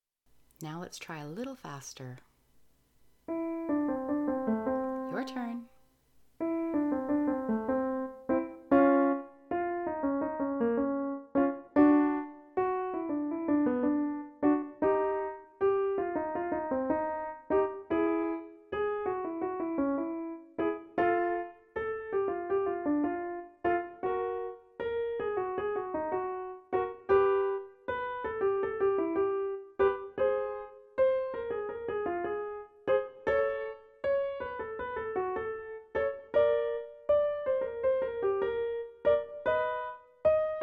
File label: jazz faster